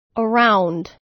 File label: Diphthongs